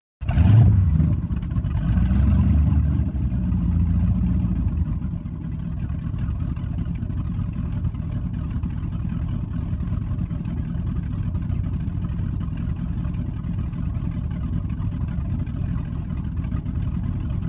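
Power Boat Interior Start Idle, off shore power boat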